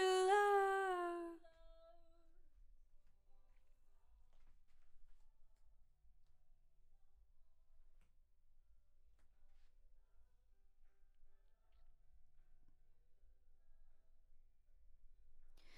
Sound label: Vox new